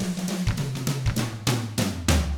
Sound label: FUNKY, Drums